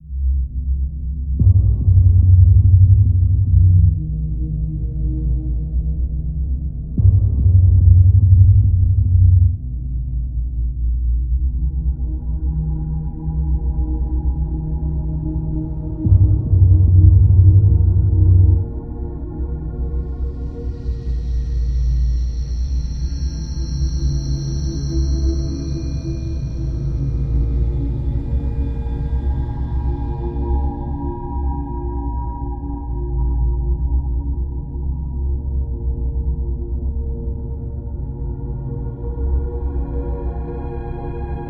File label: Ambient Scene; Tarr Chronicles Sign of Ghosts